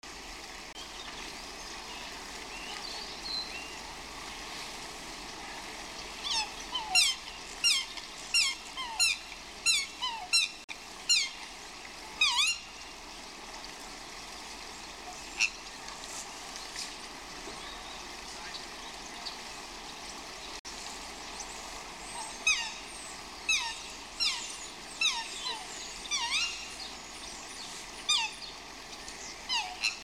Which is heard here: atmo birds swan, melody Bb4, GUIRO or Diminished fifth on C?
atmo birds swan